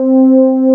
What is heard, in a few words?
MOOG SOFT VOX; Keys & Pads; AKAI S6000 CD-ROM - Ultimate